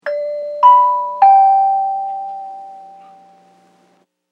Ship Dinner Bell; Bells; Musical

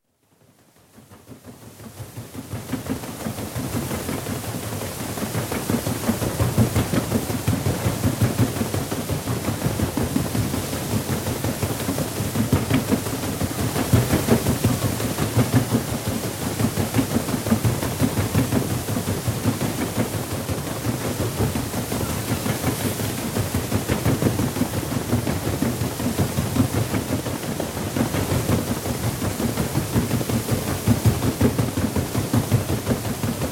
Paddle Steamer, paddles thrashing in constant run; Boat; Boats; BBC

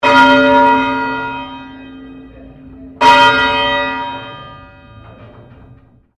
Bell St Pauls Cathedral Melbourne Bell 2 Rings

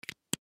Marker Cap Put On Replace Highlighter; Office; Blastwave

